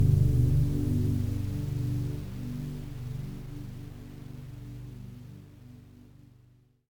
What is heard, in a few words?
Retry Jingle; Exploitation; friday night funkin vs. dave and bambi